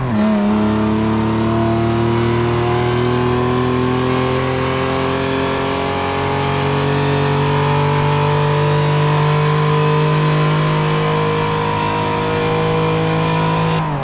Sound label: third, vehicles; gmod; tdmcars; pon fiero gt